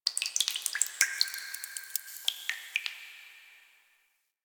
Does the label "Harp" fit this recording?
No